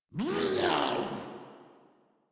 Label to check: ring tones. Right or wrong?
Wrong